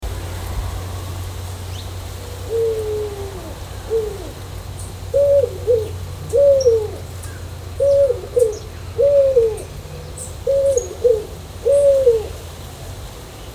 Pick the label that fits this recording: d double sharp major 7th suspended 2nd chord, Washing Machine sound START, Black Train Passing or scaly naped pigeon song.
scaly naped pigeon song